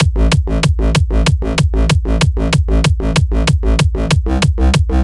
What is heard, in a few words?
KICK BASS